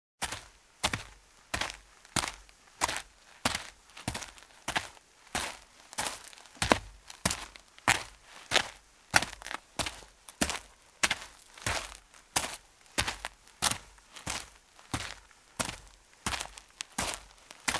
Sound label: footstep, Footsteps; BBC 55 - Footsteps 1; BruitsDePas